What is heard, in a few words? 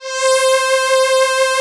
Atmo Pads; Best Service; XX Large